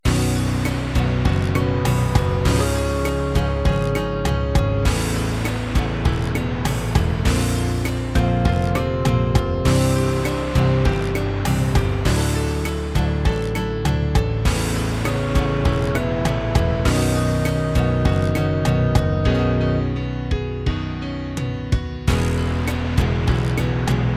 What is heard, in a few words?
Ocean Sojourn (Orch)